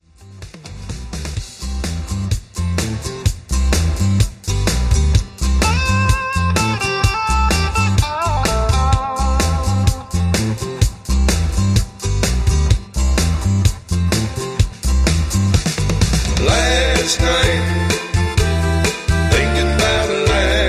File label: High Key